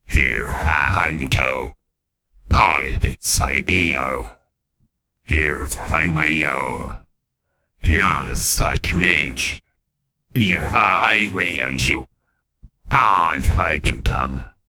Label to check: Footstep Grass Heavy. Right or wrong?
Wrong